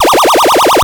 Wobble; Graz

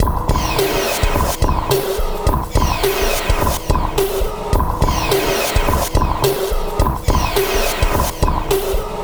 Sweep Loop; Sonic Foundry (Sony Creative Software); Esoterik Beatz

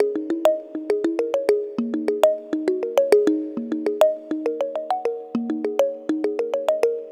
Tongue Mallet; Melodic; Ambient-Melodics-II

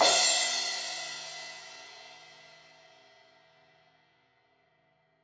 Crash 16in edge, Cymbals; Deeply Sampled Drums

